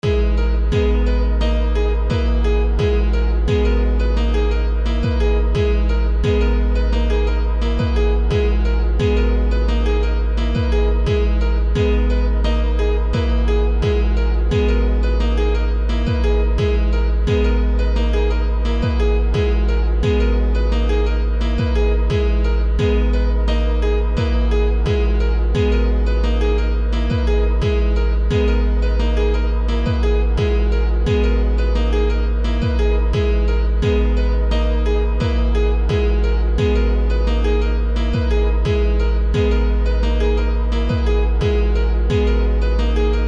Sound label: hirajoshi loop